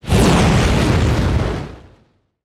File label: fireshot